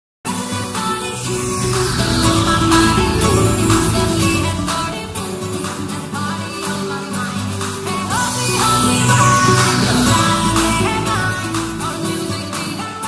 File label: lofi hindi ringtone